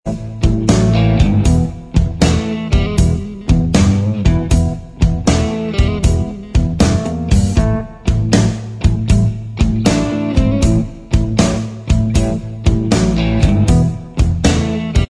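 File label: instrumental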